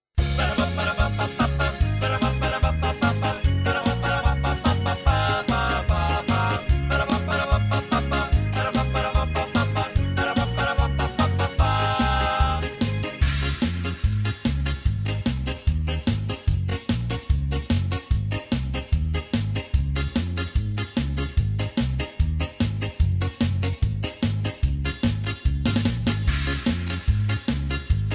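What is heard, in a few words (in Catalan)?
LES CAMPANADES base instrumental; assaig nadala 4t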